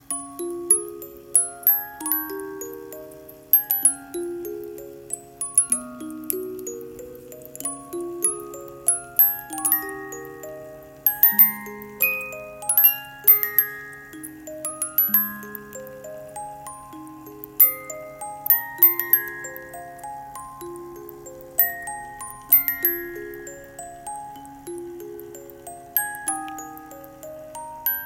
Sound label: 30 note musical jewelry box; italian inlay; heart wine red